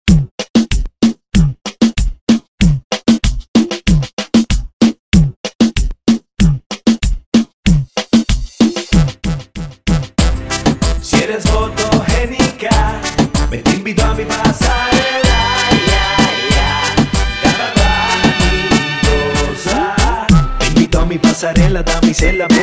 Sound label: House Remix bpm 95